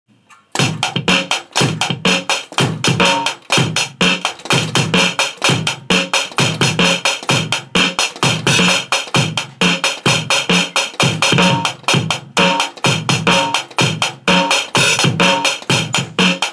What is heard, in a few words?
live drum recording